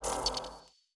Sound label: ui mission fail